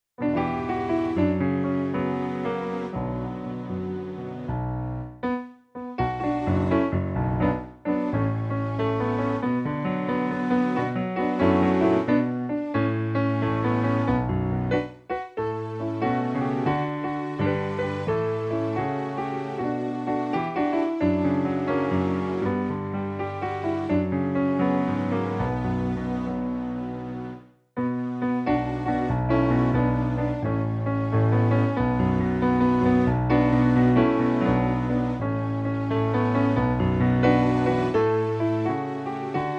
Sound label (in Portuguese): playback hino